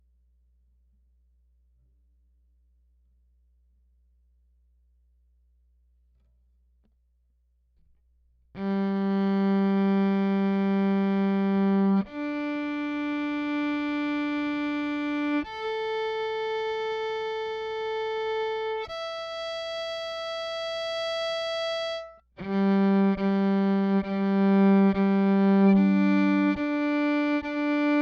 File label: pickup direct